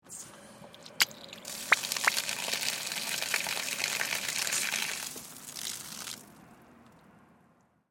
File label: Water Garden Hose Flowing Grass On Run Off; Yard Tools; Big Room Sound